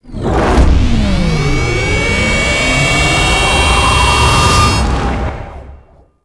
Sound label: Boss Fight Start; Tau; Twelve Minute War; Objectives Meta